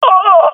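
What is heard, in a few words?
death, swat female; vo; Counter Strike Model Pack; CS:GO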